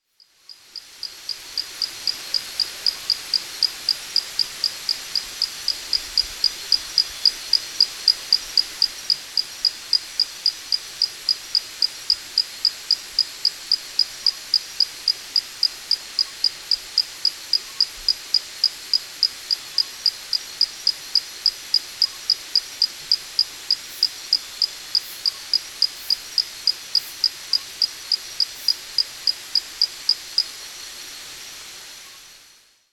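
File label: Ansonia spinulifer, frog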